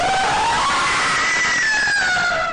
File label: woman screaming